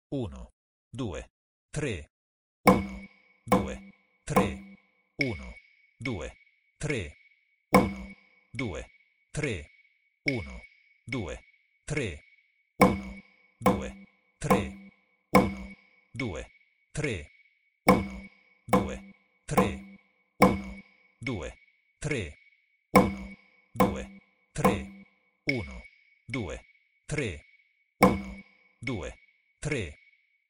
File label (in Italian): esercizio Ternario; Sonora vol A